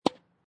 Tennis Hit Ball with Racket Distant; Sports

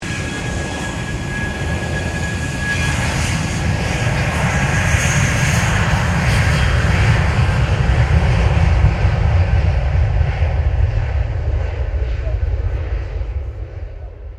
1995 A320 AIRBUS TAKEOFF; Airplanes; Vehicles

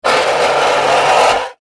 Impact Metal Scrape Short; Materials

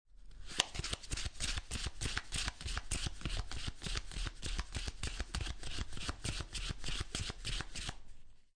Playing Cards Flip Cards Off Top Of Deck; Card Sound Effects; Casinos

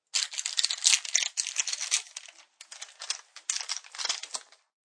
Crackling of a plastic package; Objects

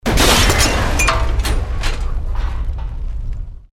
Explosion Medium Metal Glass; Created explosion effects, house, building or vehicle effects; Bomb Sound Effects

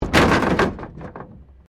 Wreck Door Panel Clunk Metal

